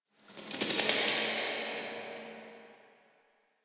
Bats; Cave; Ambience; Warframe; Stalker Cave

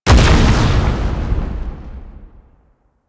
Delivery Key Explode; Warframe; Nineteeen Ninety Nine; PvPvE

